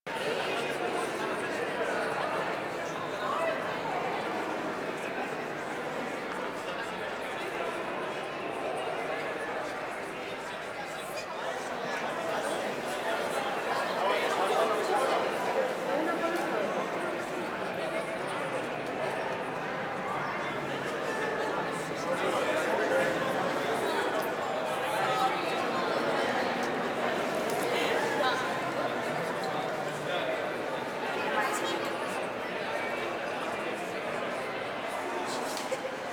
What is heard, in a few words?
Town Walla Loop; Exterior; Ambience; Duviri; Warframe